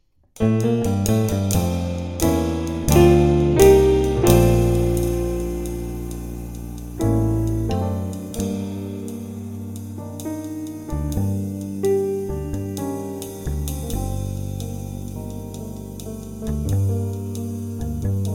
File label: Flute Sonata E Flat Major, Siciliana